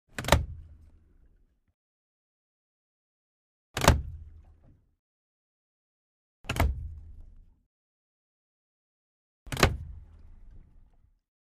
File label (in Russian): richag upravleniya lodki